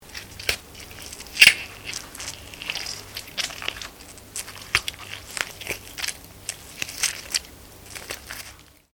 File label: Organic Manipulation Wet, Various Organic Foley sounds; Blood and Guts; Horror